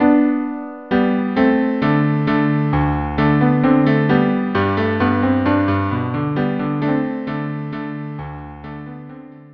minuet cello duo

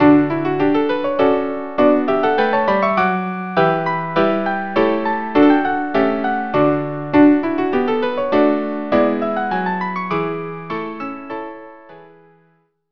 minuet; mozart

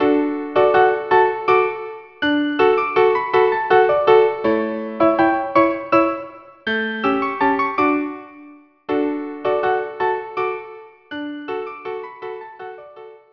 dance violin duo

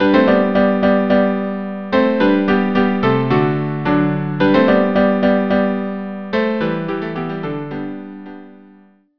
trio

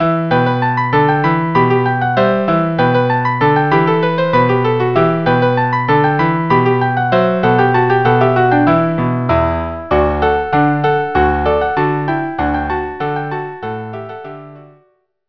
Freylekh trio

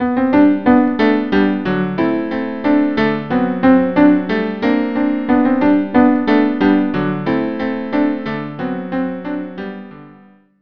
ecossaise cello

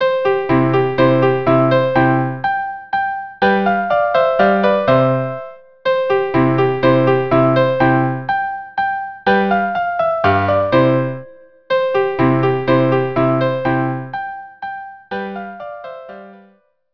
minuet in C Major for musical clock

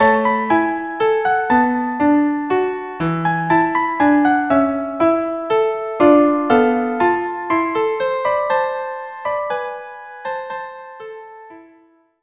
violin viola, duo; Torna a surriento

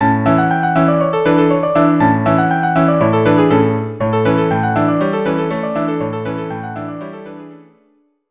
trio, string trio; Traditional Moravian/Jewish dance tune; Nuneasca (Godparents’ Dance) (string trio)

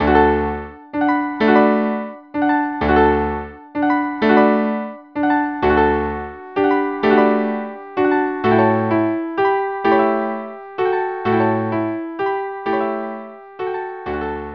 barcarole quartet

